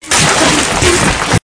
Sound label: loud gore explode